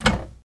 Cabinet Door Open

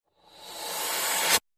Strange Reversed Air Releases Vary In Pitch

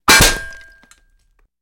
Bullet Impacts Metal

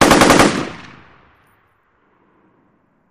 Machine Gun Sngl Burst; bursts

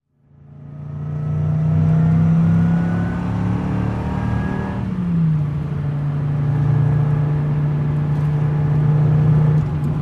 Driving Over 100 Mph, sports car

